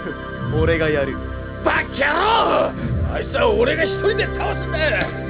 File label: scream